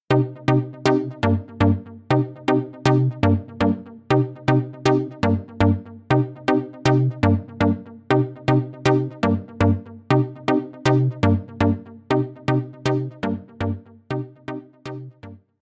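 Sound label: Lush Staccato Chords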